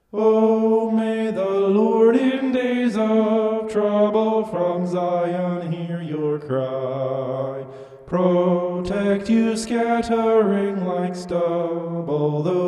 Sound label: vocal homoph; psalm 20